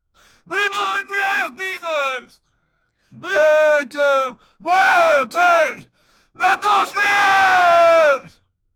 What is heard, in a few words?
running